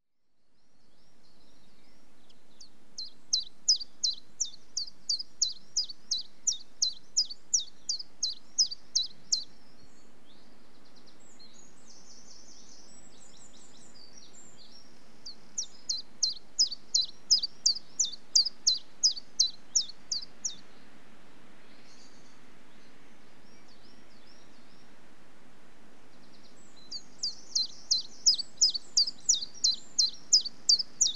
Chiffchaff with distant bullfinch, wren, and sheep on slightly windy day; British Birds; The BBC Sound Effects Library